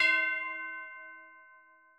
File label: C6 bell